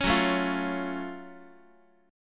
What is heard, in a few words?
F sharp Minor Major 7th, Guitar; strummed